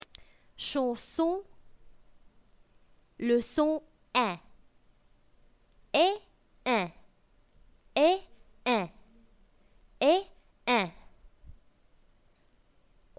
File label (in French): chanson